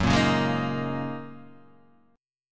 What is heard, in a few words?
F sharp 6th Add 9th F sharp Guitar Half Step Down